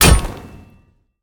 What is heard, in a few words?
basic impact; smite; thanatos